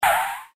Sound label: Car